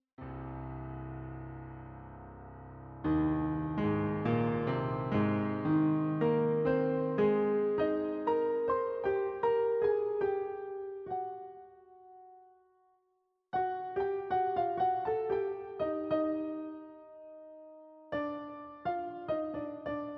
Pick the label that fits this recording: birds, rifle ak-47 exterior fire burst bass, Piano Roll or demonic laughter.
Piano Roll